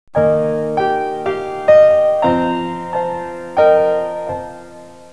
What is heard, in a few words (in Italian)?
suonerie classica; musica classical